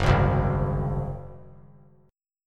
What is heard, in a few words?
Gb Suspended 2nd Flat 5th 3C,3F,3A,3D; Gbsus2b5 strummed